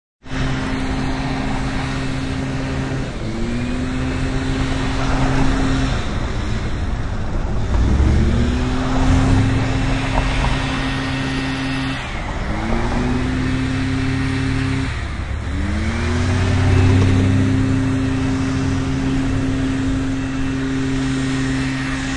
Industrial weed whacker whacks with traffic sounds.; Weed Whacker Sound Effects